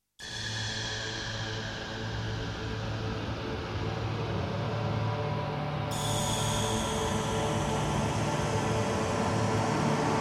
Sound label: Music; Theron's Quest; PC Engine